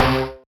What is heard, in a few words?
marimba steeldrum